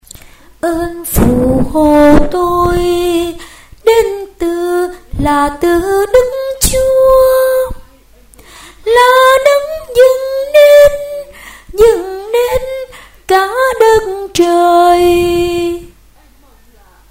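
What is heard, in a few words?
soprano tenor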